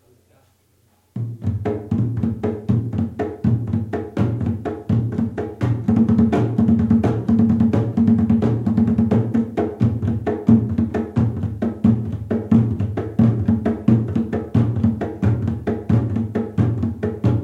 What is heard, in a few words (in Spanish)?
toque